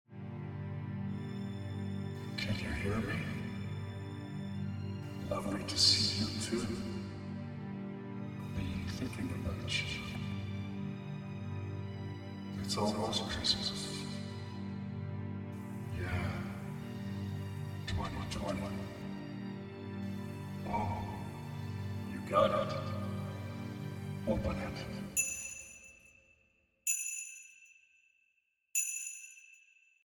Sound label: Horror w Vocal; XMAS